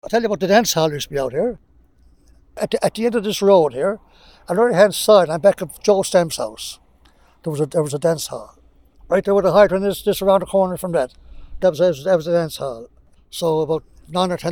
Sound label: dancehall